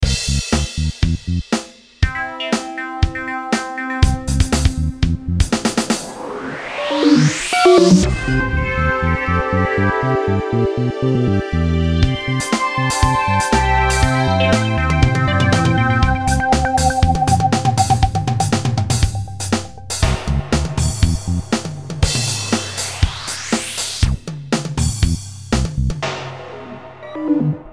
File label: BEAT HAPPY SYN, Beat Happy Synth Rock; Miscellaneous Loops